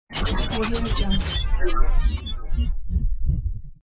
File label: data received